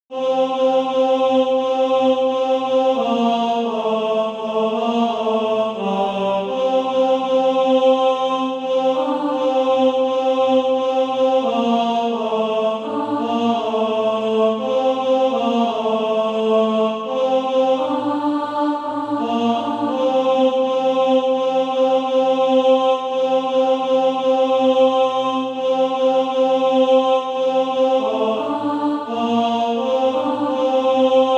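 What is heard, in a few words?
tenor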